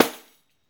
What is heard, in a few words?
Pandiero, Tambourines